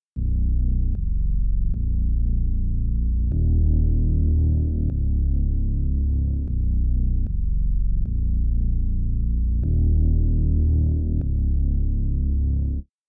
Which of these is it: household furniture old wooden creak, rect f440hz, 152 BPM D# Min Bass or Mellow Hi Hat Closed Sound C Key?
152 BPM D# Min Bass